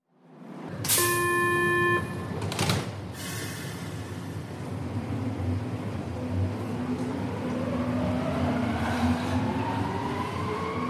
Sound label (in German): UBAHN